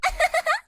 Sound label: laugh